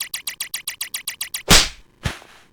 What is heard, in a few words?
surprised; crab